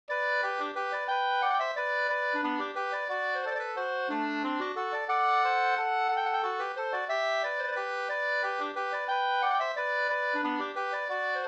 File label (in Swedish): vals springvals; Bohlins springvals Up